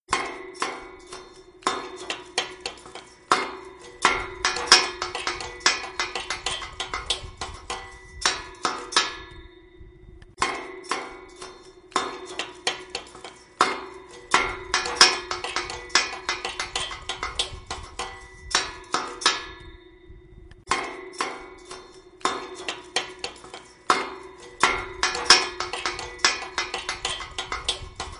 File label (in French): Cliquetis